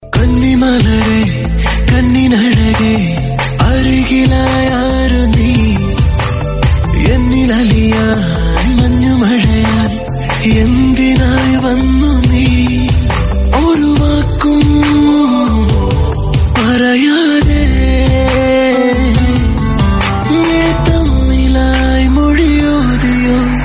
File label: tune in malayalam